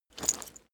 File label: leather metal movement soft single